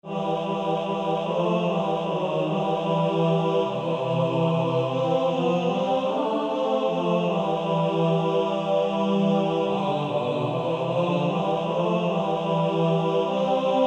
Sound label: Male Choir